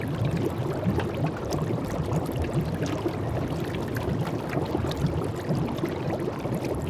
tide ctr loop deep, ambience

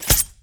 dagger

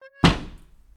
open window

